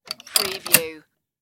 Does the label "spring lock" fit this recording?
Yes